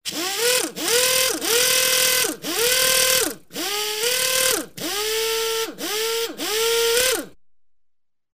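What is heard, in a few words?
pneumatic impact wrench idling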